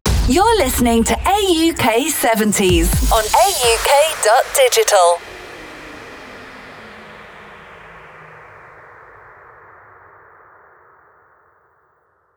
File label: 70s